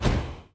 necromancer projectile; Shelter

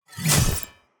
vampire strike; Shelter

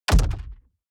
environment gold block destruction kick

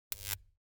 zone electricity zap

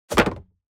object grab wood

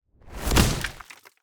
stone golem little strike; Shelter

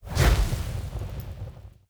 Crystal Lights Up; TANK; 3Souls

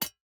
environment pickaxe hit iron

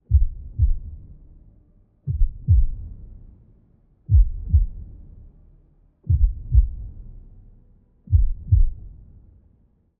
ambience heartbeat LOOP

